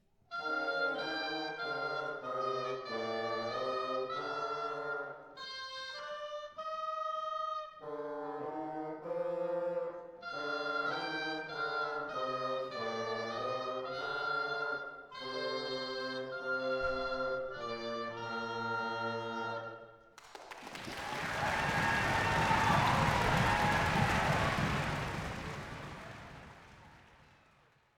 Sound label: Oboe and Bassoon